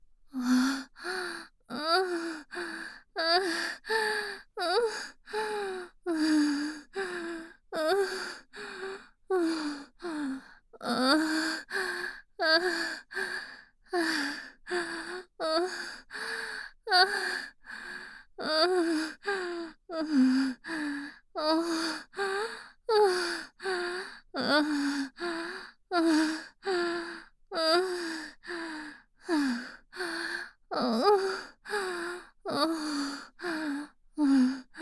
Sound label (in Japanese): 喘ぎ声(小)